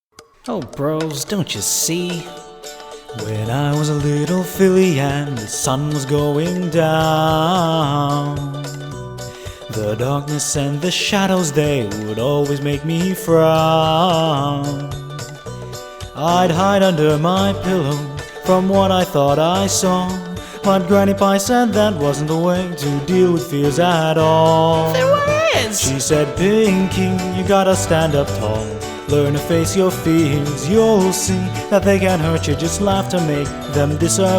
Vocal Cover

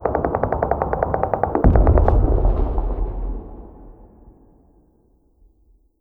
city battle

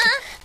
Moan

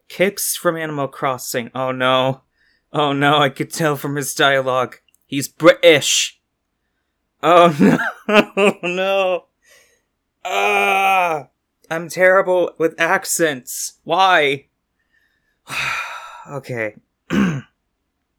kicks voice

